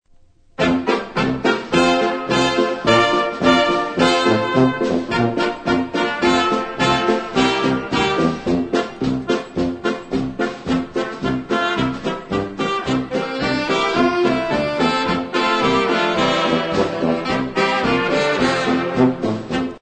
TOM CAT POLKA

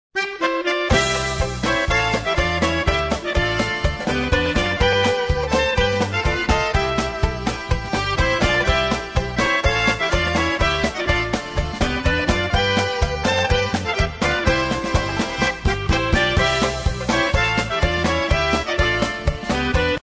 FLUTOPHONE POLKA

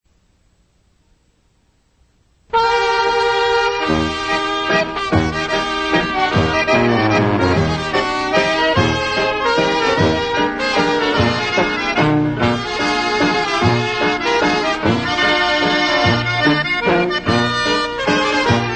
FALLING APPLES LAENDLER

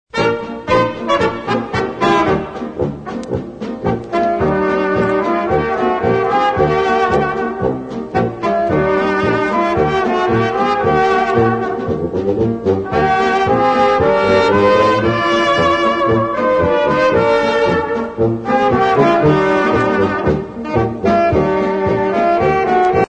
polka

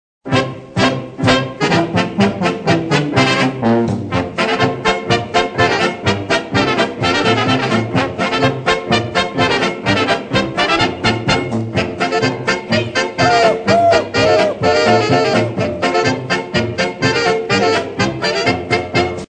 WEST WIND POLKA

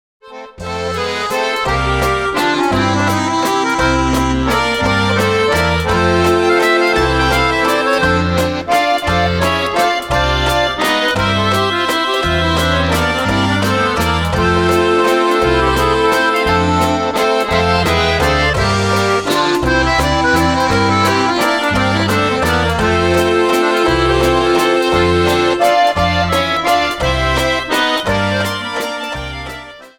Harmonika Waltz

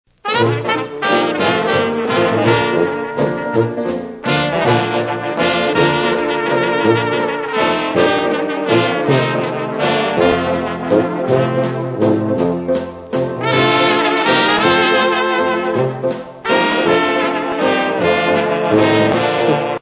WALTZ

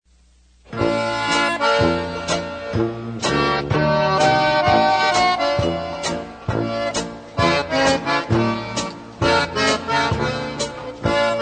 LITTLE GERMAN BAND POLKA

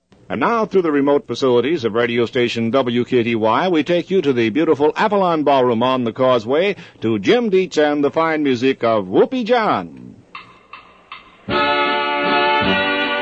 waltz

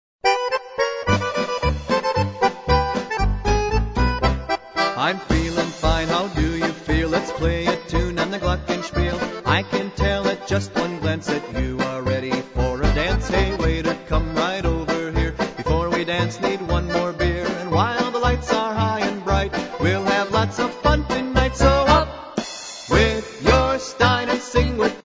polka

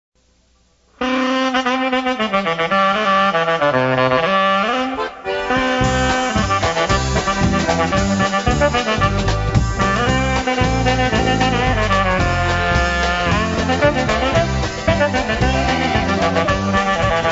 sax

